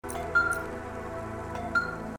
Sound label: incoming call; cloudpunk